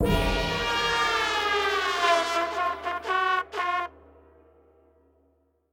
End Stinger; Sogport Scraps Theme; Tearaway Unfolded

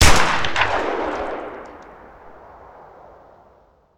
Shotgun Fire

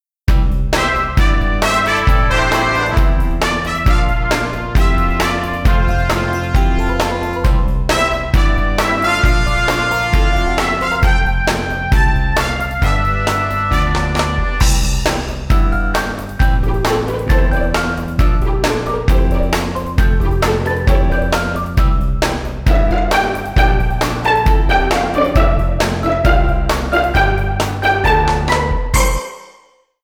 Music Menu; Army Rescue; Wii

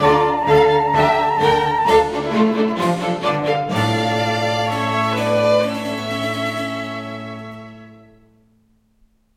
Parisian Palace (Transition); Cooking Dash

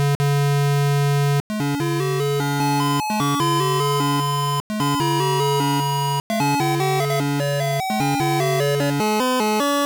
Spooky Ghosts; King's Quest II Romancing the Throne; IBM PC, PCjr, Tandy 1000

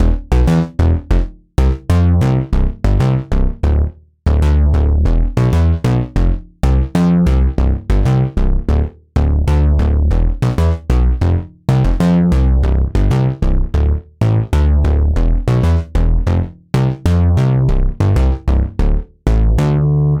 Poly Bass

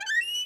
Double Doors; Levels Hub Restaurant; Act Closet; Fx Double Doors Open

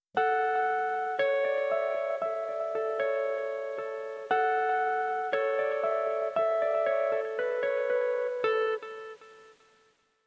PIANO SOLO

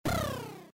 Shot; Bayo Shot

